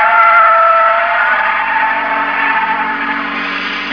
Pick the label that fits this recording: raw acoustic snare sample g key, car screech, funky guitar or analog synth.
car screech